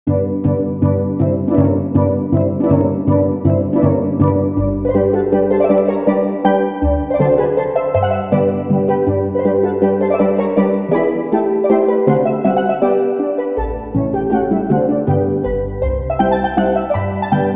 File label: Mazurka; Chopin; Op67 1